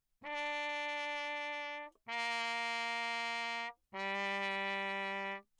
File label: TRP MUTE Bach low; Perinétventiltrompete; Mutes